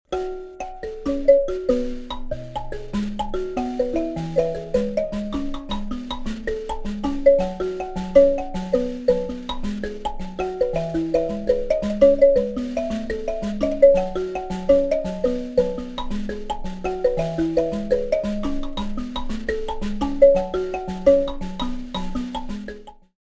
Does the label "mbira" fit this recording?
Yes